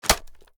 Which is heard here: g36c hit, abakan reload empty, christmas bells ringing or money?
g36c hit